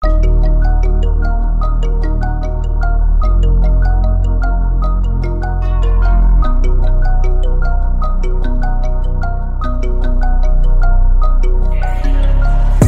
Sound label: Instrumental